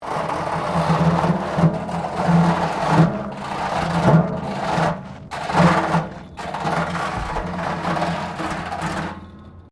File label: METAL BIN SCRAPE WIRE FENCE; Metal (Sound Effects:Materials)